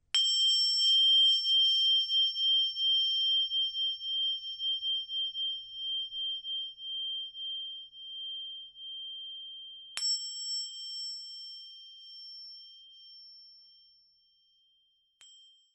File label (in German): klangpyramide